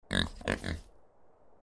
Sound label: pig